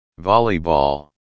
volleyball

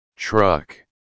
truck

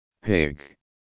pig

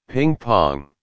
ping-pong

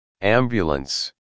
ambulance

